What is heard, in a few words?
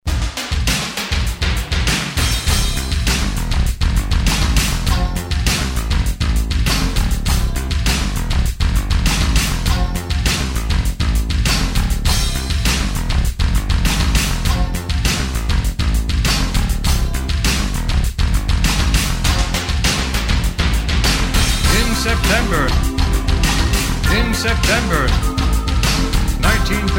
harp; Water Engine